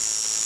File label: light buzz